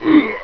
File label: GRUNT